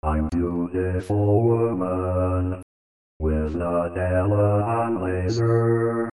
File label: cellos